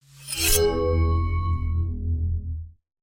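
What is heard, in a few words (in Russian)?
меч клинок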